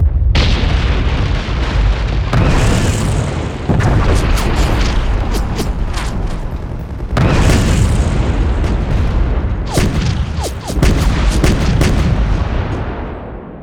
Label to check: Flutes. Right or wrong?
Wrong